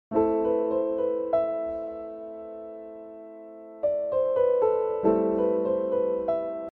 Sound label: sad theme